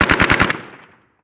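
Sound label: gun ak47 distant; ModernWarfare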